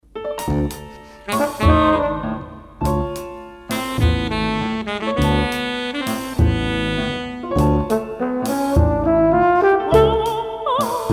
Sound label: Balaton tango